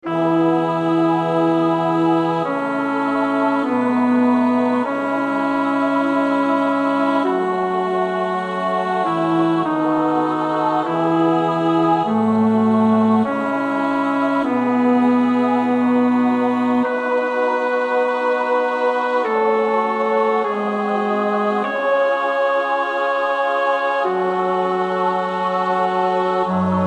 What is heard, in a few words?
Psalm 84 (bas); Parry (Hubert H.)